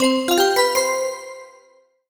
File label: Bell Voice Positive; MUSIC EFFECT; startide